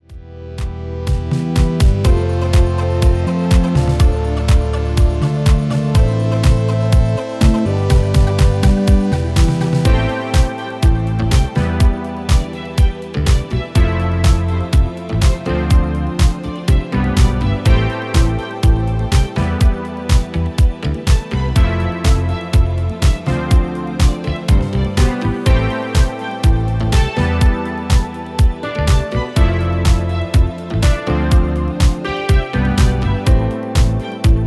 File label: Disco Pop Whisper; Le Corbeau d'Argent Production